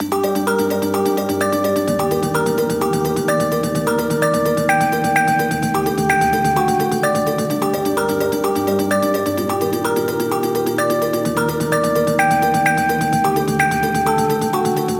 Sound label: Arp Loop, 128 BPM G# Min, Synth; Future Bass